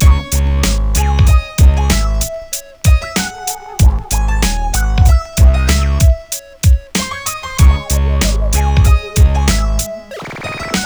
Ditty; NBA Inside Drive 2003; Xbox

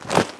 Shower; Piglet; Neighbours From Hell Console Edition; GC, Xbox

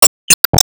Wall Bound

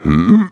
Huh

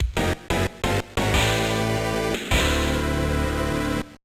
Unknown Fanfare

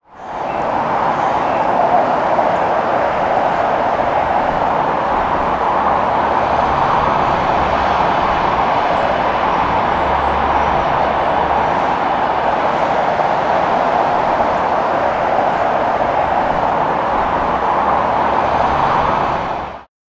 Cities cape Wind

